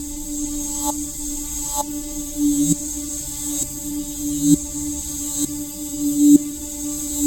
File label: Pad; Dance